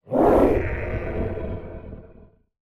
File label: dragon roar small; creature dragons